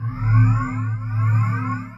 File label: ui gate